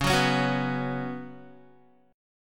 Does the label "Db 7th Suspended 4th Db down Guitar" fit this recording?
Yes